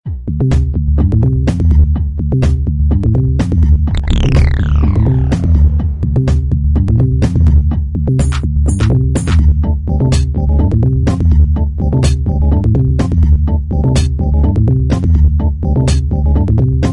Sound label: Bass Mania